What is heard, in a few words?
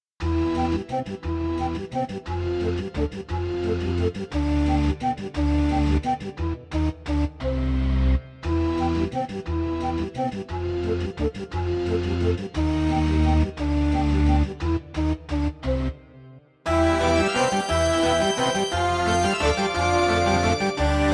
sideshow organ